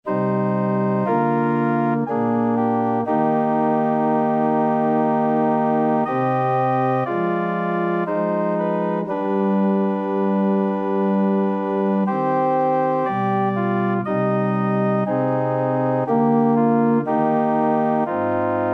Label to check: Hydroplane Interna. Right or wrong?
Wrong